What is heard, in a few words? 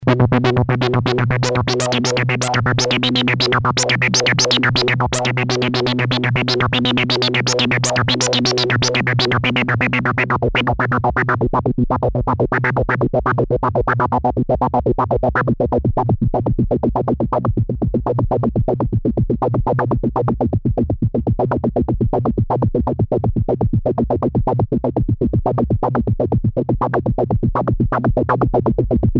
Repeat VCF